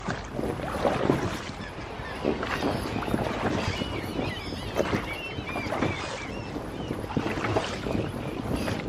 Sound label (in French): Mer Loop